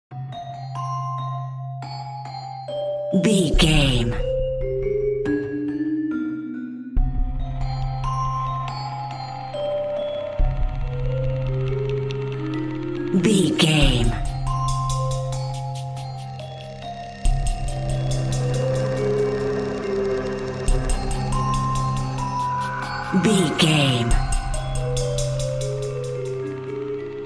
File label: mysterious shadowy figure narrative